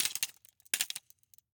Roller skates, old fashioned, all metal, wooden wheels, clash, strike, clatter, ping, stutter; Eiravaein Works; Vaeyan IV